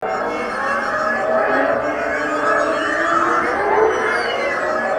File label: HORROR; East West; Global Sounds